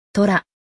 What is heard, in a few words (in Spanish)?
tigre; Relaciona Palabras en Ingles